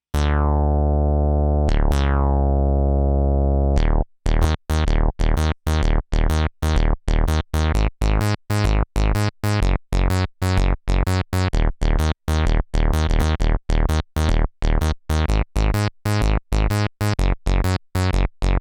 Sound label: bass juno